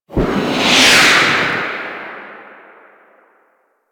Boost Thruster Warp; Vehicles; Archwing

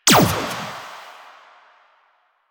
Shot Fire; Warframe; Akarius Prime

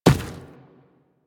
Infested Medium Run Dirt, footsteps; Warframe

